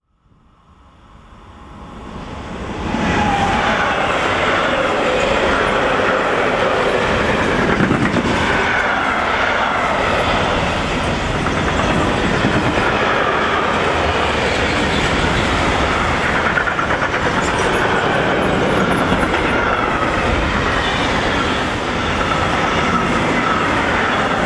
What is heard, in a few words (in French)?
trains diesel et electriques; sonotheque